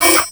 CycloBomber Shields

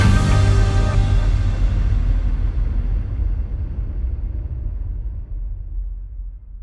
Battle

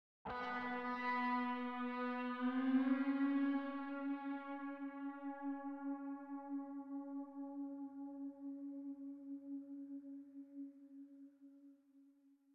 Guitar Nights; starbites; Miami

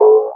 Locked; Superb Ui; Nickelodeon Super Brawl Universe; Android

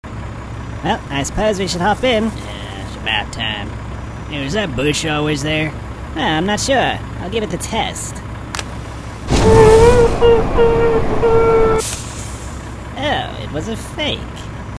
Prop

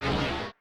Dark Monster voice prepare

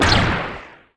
Homing Projectile

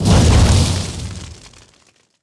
Monster Generic Foley Hit By Exploding Device; gamerip

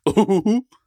Yelp; Fat Grubfather

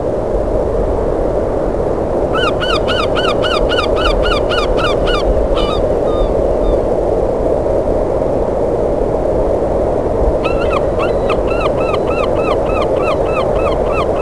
Amb Seagulls

